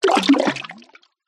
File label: multimedia game designed water splash